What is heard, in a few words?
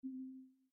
press; Mindustry